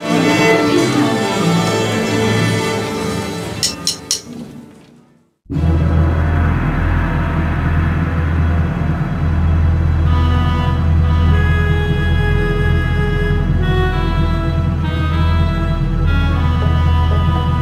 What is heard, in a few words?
Intro Music; Henry Hatsworth in the Puzzling Adventure; 2009 nds gamerip